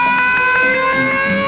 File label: SIREN DOG